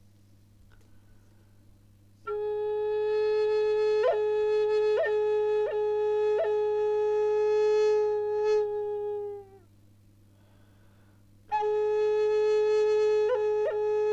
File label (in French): flute amerindienne la 432 branche noisetier